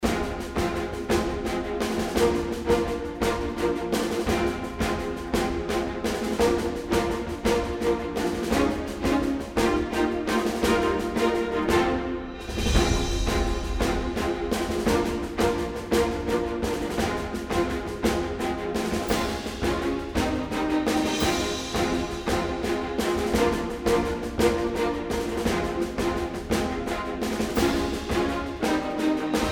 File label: Battle Theme Loop